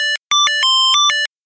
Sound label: motorola pager